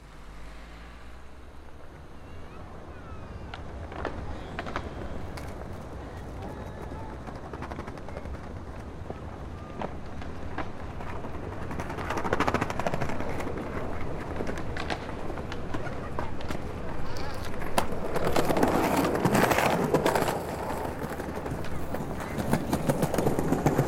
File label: Skateboarders On Heroes Square